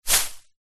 household vhs tape massive amount loose throw down on ground